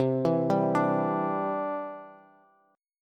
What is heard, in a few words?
C Major Add 9th, Bass; arpeggiated